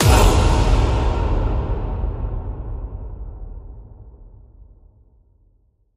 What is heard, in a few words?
Breathless Big Hit